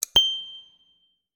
swing up start diamond; LG Wing 5G SKT

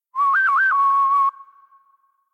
Wildwest; WindCanada

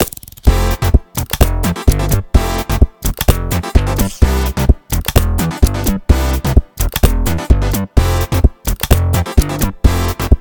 Pen and Paper, ringtones

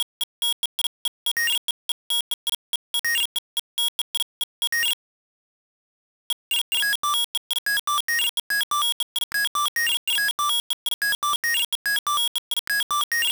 MONO minimal; Nokia; Monophonic

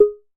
Electronic, notifications; Oppo Find X5

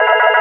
score count; Bounce Touch

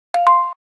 Video Record, ui

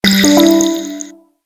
Waterflow; Huawei Ascend Y330

